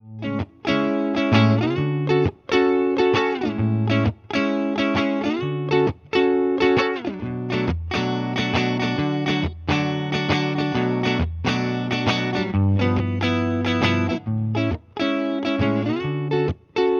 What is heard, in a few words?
electric raw eq comp